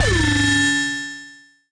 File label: nasty stormfront; Nasty Stormfront Electro FX